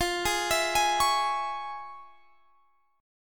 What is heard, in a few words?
F Minor Major 7th Sharp 5th, Guitar Standard, chord; x,20,18,21,21,21; arpeggiated; capo=3